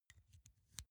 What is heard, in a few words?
insert xlr extension quick; objekte; elektronik